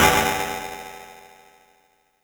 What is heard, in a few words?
Cymbal FX